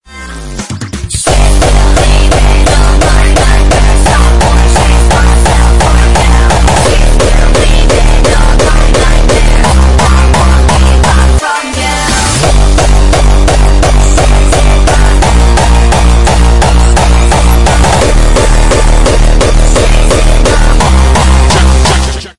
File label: gated hardstyle sad emo